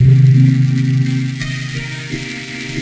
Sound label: Melody, IDM